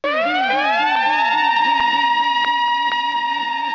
TECH KIT, RAVE

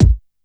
BD, Casio CTK-5000

